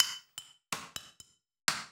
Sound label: bottle, ethno, 125